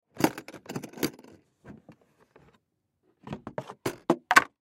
Toolbox Open, Box Open Remove Tray Plastic Toolbox; Factory; Industry; Blastwave